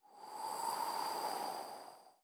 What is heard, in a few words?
Mouth Blow Long